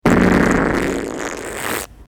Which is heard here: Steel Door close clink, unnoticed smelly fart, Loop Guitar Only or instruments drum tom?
unnoticed smelly fart